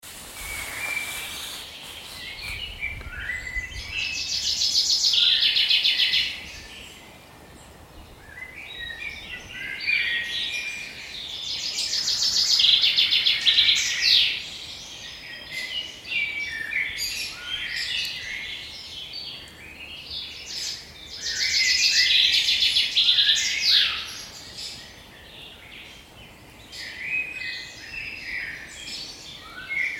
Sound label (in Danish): Fugle, naturlyde